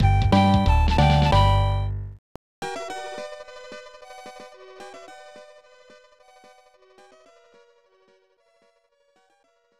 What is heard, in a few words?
Bonus Door; Out to Lunch; snes